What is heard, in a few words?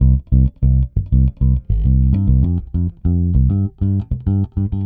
Pop, Bass